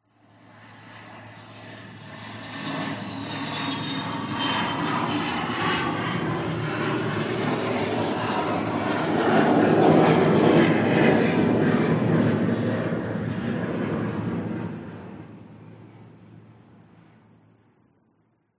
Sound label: vehicles airplanes military f15 or f16 fly past distance